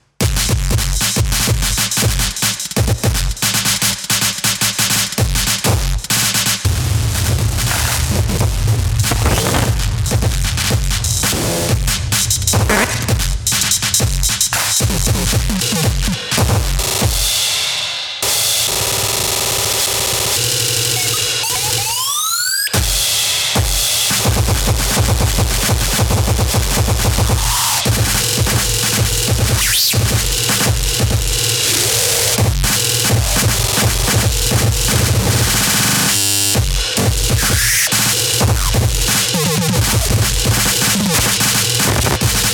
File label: noise